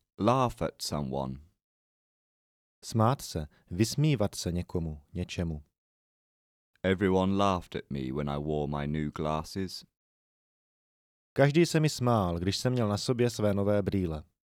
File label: laugh